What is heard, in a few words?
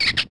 Bat; urbanchaos